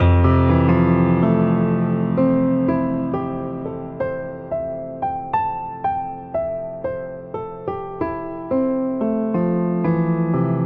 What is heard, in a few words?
grand piano up n down loop; KEYS ORGAN PIANO; MELODIC SAMPLES